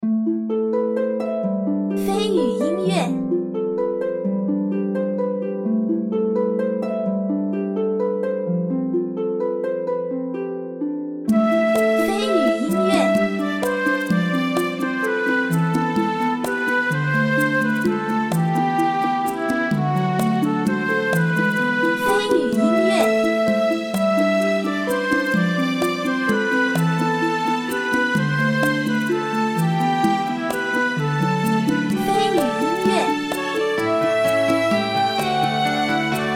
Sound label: function interface